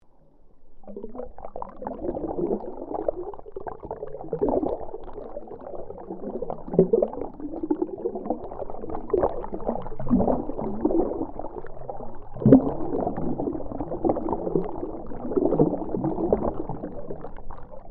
Water Movement Through Water Pitched, recorded in a domestic pool; Nature